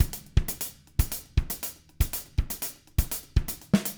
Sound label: 120 CARIBE; Sampleheads; Peter Erskine Living Drums